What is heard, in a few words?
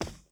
Foot carpet; We Happy Few